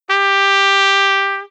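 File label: trumpet g; SOL di tromba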